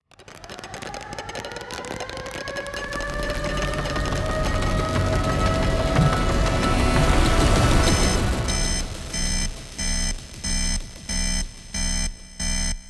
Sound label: automaton